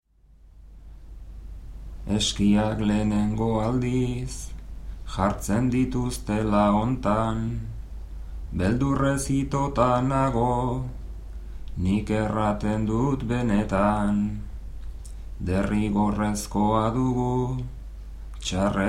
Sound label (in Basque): bertsos